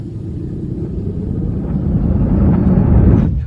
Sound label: flashback wooooosh; lost